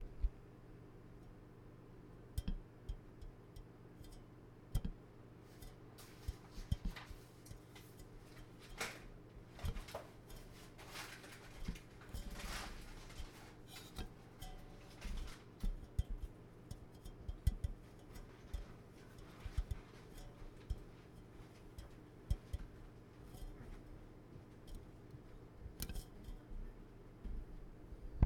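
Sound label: Hand On Kanteen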